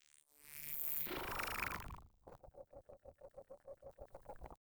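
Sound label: futuristic biolab electro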